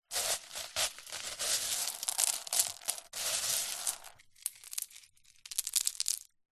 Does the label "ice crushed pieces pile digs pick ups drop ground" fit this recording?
Yes